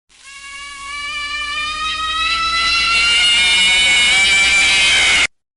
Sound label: jumpscare backwards; fnaf